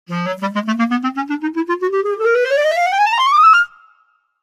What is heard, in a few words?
Clarinet Walk then Run